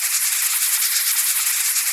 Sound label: Catherine Wheel Loop